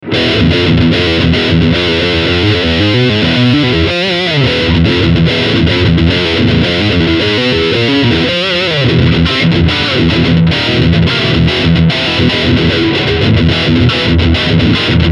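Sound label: Overdrivechannel Scoop Sound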